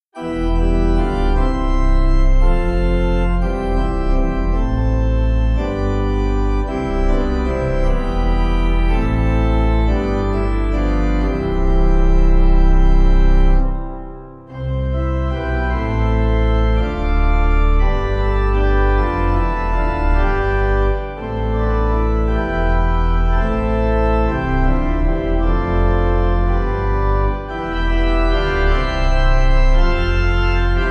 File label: Baptised In Water Bunessan Pipe